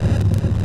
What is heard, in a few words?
Block Sliding; unsighted